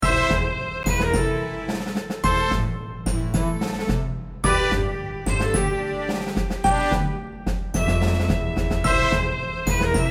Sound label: Orchestra; dragon fantasy book i the volumes of westeria